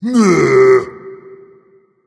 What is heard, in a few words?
tongue, voice; Smite; ymir skin6